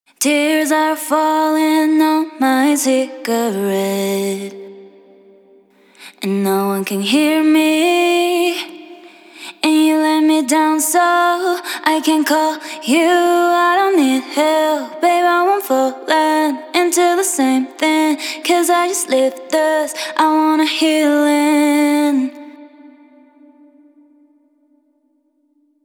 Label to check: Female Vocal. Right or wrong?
Right